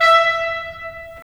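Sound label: wind clarinet; LA Composer vol. 3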